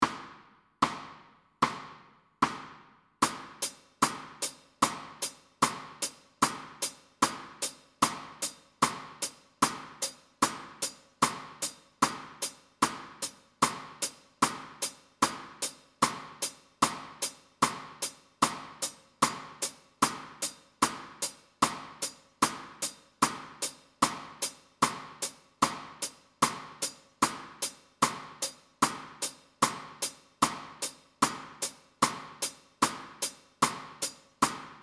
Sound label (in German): Taktschlag 16tel